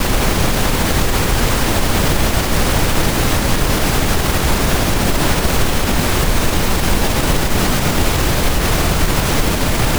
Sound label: Wind; liblast